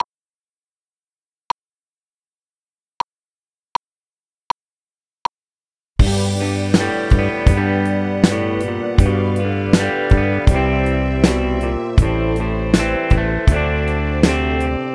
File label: GTR Drums